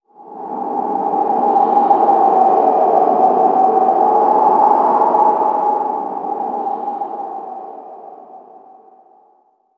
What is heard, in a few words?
environment wind stinger